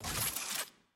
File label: reload; jhin